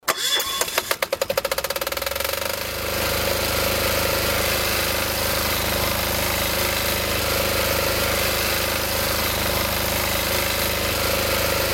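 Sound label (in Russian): diesel generator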